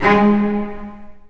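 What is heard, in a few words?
Cello Spiccato G#1; Digital Sound Factory Virtuoso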